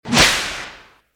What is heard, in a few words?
shot; spaceshooter